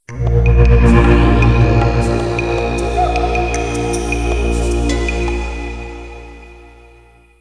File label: Startup; Mandriva